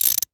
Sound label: Industry Tool Ratchet Turn Short